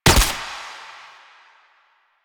Pistol Shot; Weapons; DE; Warframe; Tenno; Lasrian

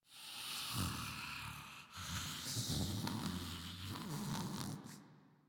Breathing; Grineer Ghoul Expired

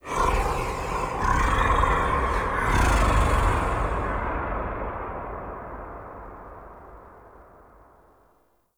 Sky Breaths; Ambience; Duviri; Warframe

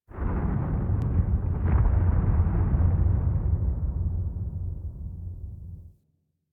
Landscape Glacier Ice Distant, Ambience; Warframe; Venus Open World; One Offs

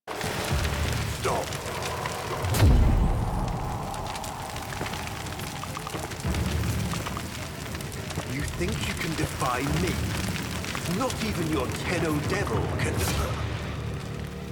Tree Fire, Forest Night End; Warframe; Sacrifice

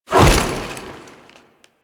Foley Melee Sweep; Warframe; Kahl

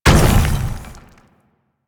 Bowl Land; DE; Warframe; Warframes; Grendel

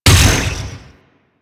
Ship Dual Cannon Shot; Crp Moa Dual Cannon

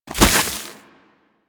Ice Proj Fire; Warframe; Corpus; Arachnoid Camper Terra; Abilities

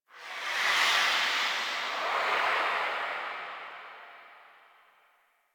cinematic player move; Warframe; Ambience; Hub; Tenno Junction Relay

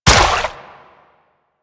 step water; Warframe; Infested; Zealoid Prelate

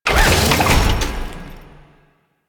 Customization UI Close; Veilbreaker; Kahl

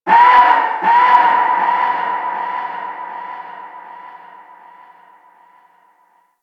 Outpost Creature, Ambience; Warframe; Corpus Outpost

